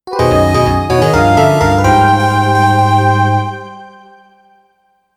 jingle

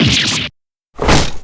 damage

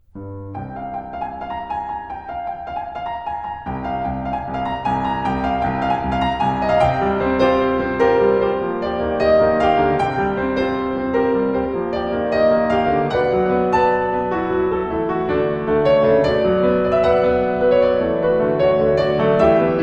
piano

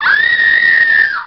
scream

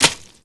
chomp

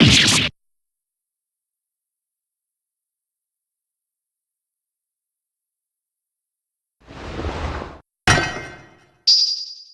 breakdown; payne; gaspen

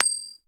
coin

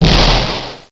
damage

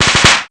firecracker pop

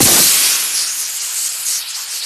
gasping

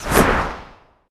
umbrella out; bonny betty

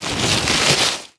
newspaper; scuttlebutt

